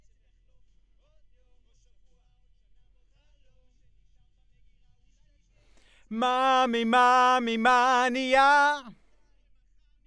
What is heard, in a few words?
B. Vox